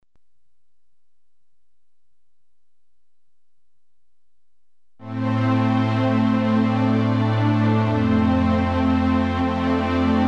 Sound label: Mellow Strings